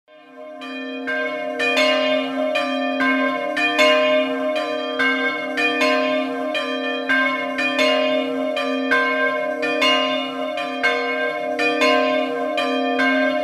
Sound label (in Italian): campane; clavais